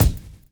drum chop; AMG Gota Yashiki Groove Activator

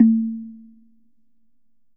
slit drum, drums percussion

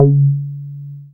Bass SH-101

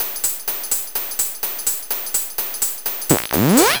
Hats, Drum Loops 126bpm, Nu Dance Electro; Zero-G; Soundclash Nu Dance Electro; Acidized Wavs; Berlin Glitz

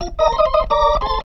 B3 LICK D#, PLAYED

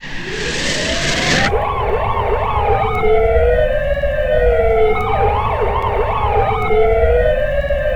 SIRENE IN, TOWN FX; USB Soundscan vol.02; Underground Hip Hop; AKAI

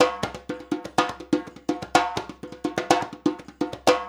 percussion loop

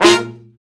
BRA SECT; BRA SECTIONS

